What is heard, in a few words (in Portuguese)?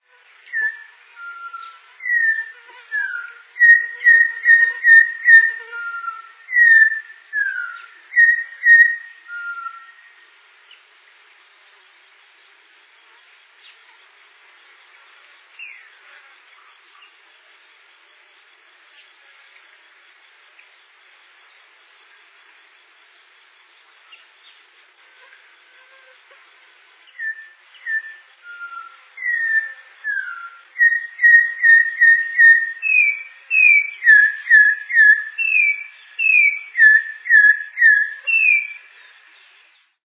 sofre